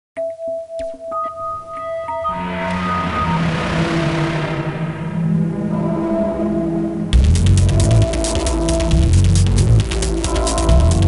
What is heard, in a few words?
Alien Battle; Lego Battles 2009 NDS